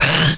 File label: pain; Alien Arena; firebrand